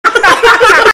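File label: ha ha ha laughing group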